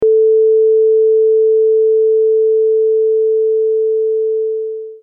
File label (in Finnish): kompandointi